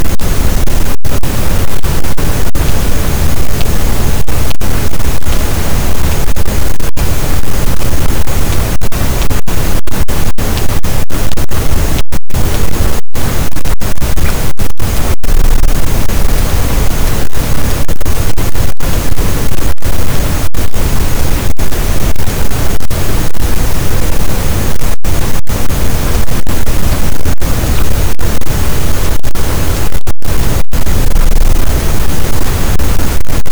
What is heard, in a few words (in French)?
cachalot, Physeter Macrocephalus, gaussian noise